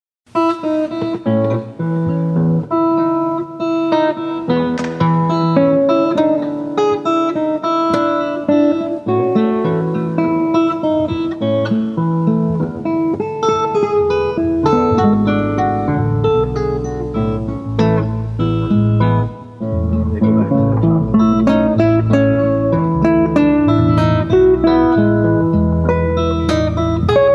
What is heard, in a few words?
classical